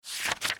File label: Menu Manual PG Left